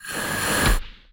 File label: enderchest close; mcl chests